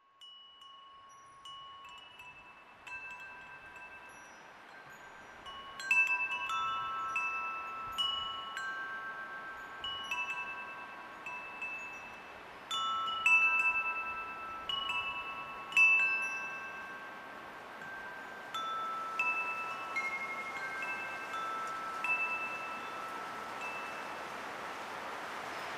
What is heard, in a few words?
Wind Chimes